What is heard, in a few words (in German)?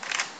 laut